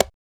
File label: CGA MUTE, congas; Drums In Your FACE!